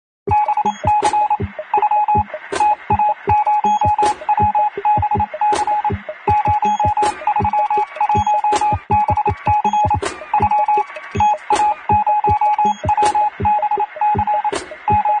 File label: morse code